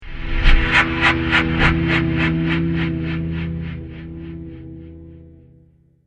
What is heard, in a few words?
Rough Future Trailer Hit